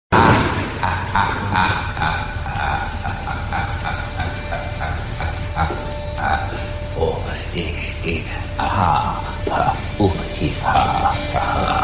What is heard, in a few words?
Ringtone; Joker